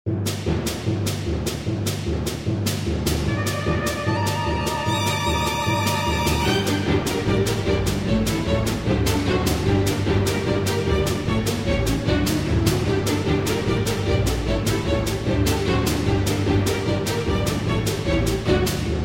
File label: Skipping Through the Orchestra Pit, Cinematic